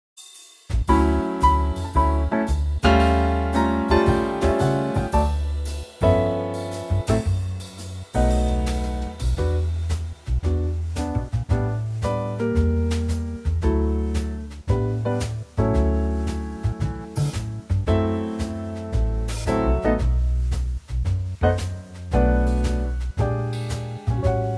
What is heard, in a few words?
D trio